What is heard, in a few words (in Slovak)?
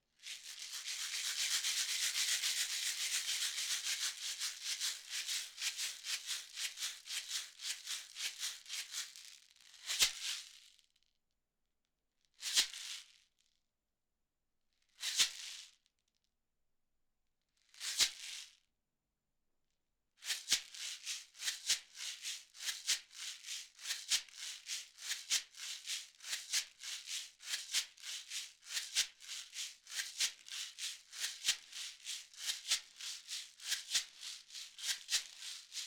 samanske chrastidlo dazd